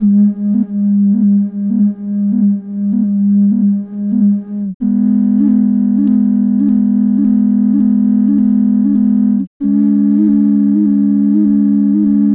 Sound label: Harmonic Ocarina Pulsing (Key of G3)